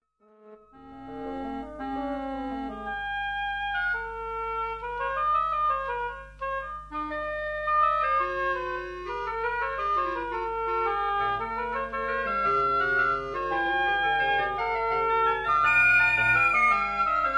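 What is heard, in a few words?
wind quintet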